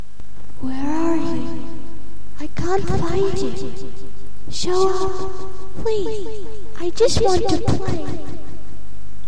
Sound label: Ghostly Girl